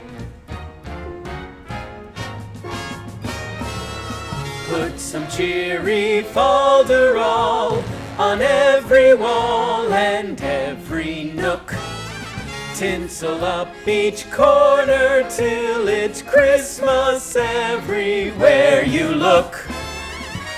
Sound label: audition; elf; sparkle